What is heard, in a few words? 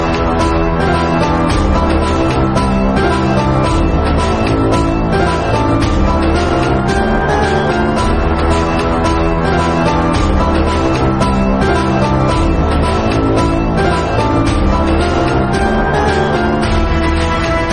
climax ship bgm; kgf